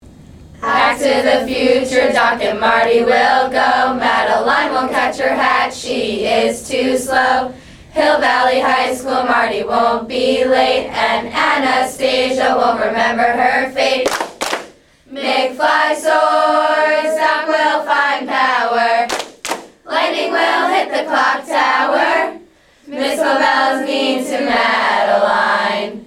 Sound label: Its my Life Cheer, Camper Fun campsongs